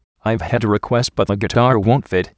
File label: guitar